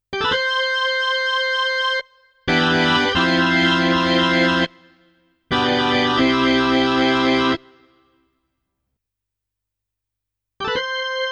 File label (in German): Orgel